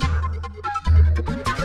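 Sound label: Music Dpad 145 Maxisphere; from dust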